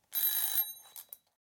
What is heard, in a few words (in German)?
Kueche Teeuhr